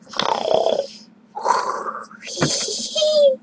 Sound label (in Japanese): 寝息; 音MAD; Cookie